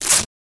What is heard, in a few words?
Tape Rip Quick